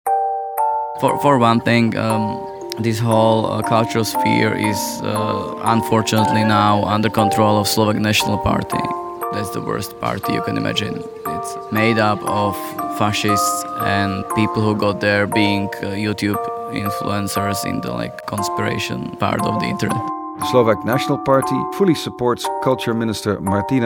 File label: jingle; uhljac